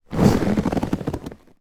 household leather chair cushion sit down squeak creak